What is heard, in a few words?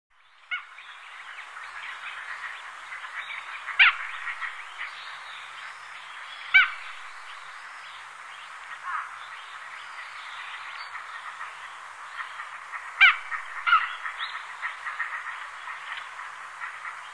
Aix galericulata; Sakhalin; Kuril; sea; priroda sakhalina i kuril